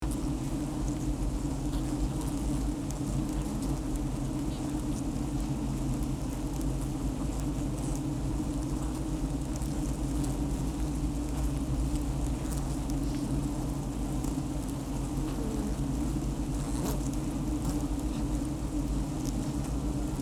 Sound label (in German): Im Bienenstock Lavalier